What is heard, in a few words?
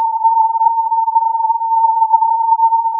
filtered input